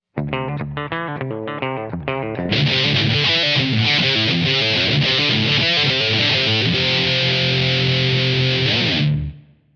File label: solid metal; Damage Control